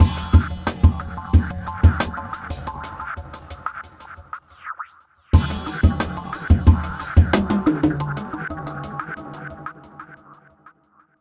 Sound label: Perc